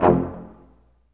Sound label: BRS Quintet staccato, Roland; Roland L-CD702